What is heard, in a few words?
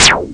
shot; shoot out